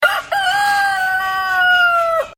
rooster alert notification sound

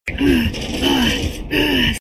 glitter sigh

